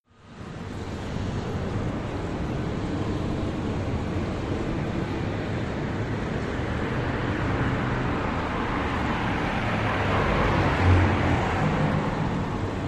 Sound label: Industrial Harbor Amb